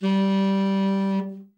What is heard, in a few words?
TEN SFT, TENOR SOFT, Sax; Giga Samples Collection